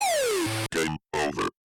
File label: game over, ringetone